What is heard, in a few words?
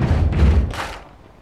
Drum loops